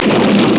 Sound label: Scrape Wood; Education Publishing Concepts